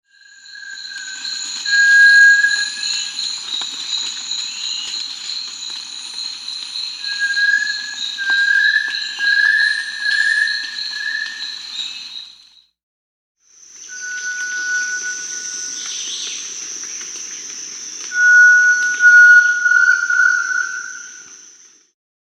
Variegated Tinamou (Crypturellus variegatus), Venezuela, Brazil; S. America